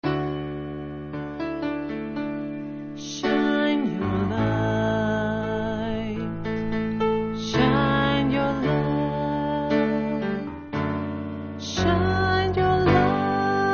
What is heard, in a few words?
shine your light on me bas